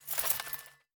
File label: Feather